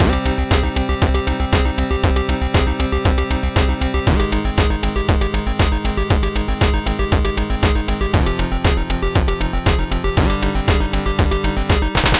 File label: loop 118